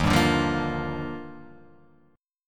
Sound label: Eb Major 9th; strummed; x,x,1,0,2,0,0,2; EbM9